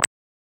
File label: ui click sound